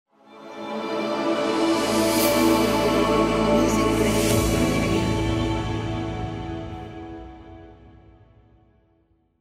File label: Ambient Northern Lights Logo